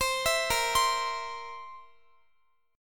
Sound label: A sharp Suspended 2nd Flat 5th A sharp, Guitar; A#sus2b5 arpeggiated; x,x,22,21,22,19